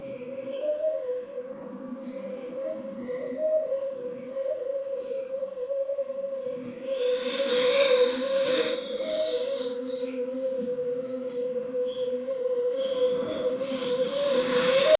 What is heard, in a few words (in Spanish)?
un ambiente de selva amaz, jungle